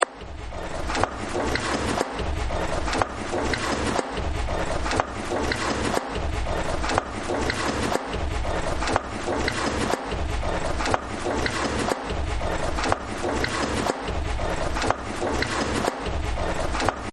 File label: Factory